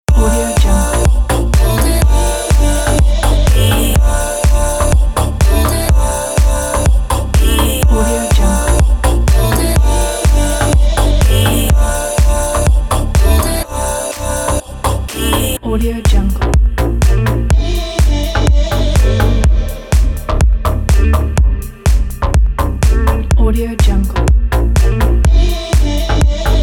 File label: deep house dance loop